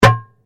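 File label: Drum Darabuka Slap mezzo forte, Egyptian Darabuka Drum; Commanly available Egyptian Darabuka Drum; Musical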